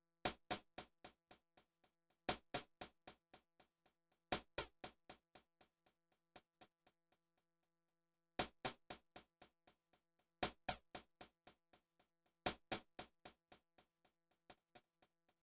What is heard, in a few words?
hi hat with delay